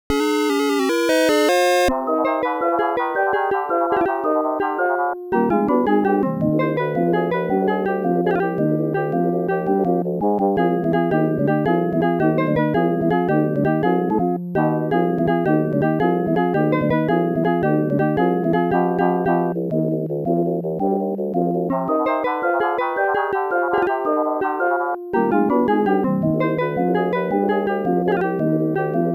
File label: ~Bataille~ (Encounter ~ Battle Food Empty ~ Victory)